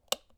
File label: switch, UI Audio; Kenney's Sound Pack